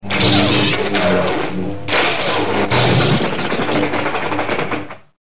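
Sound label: lightsaber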